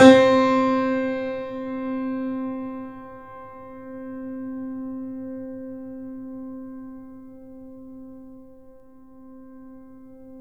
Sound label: The Piano, sustain